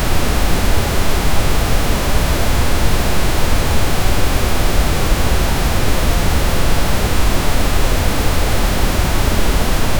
soft pink noise with gent

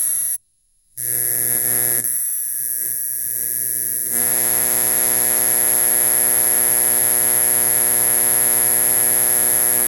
welding arc crisp high vo

